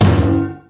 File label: gronk; Weird and Interesting Hits; single hits